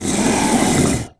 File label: scrm; The Elder Scrolls III - Morrowind